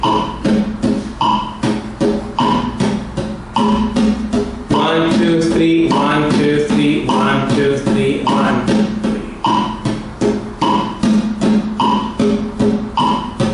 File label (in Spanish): Rasgueo, guitar